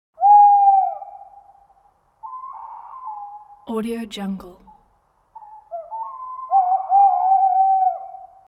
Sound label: owl; mantlee